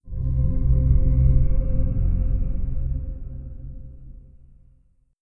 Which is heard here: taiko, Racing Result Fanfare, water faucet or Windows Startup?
Windows Startup